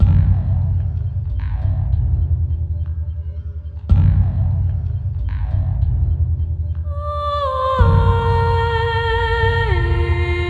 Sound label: Gallery Music Loop